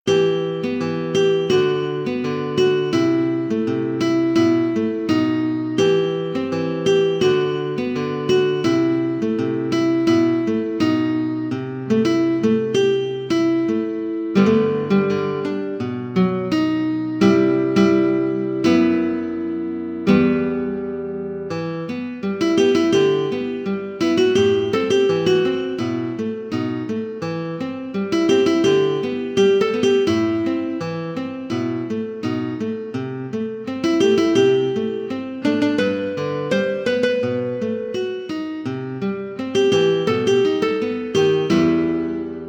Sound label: synth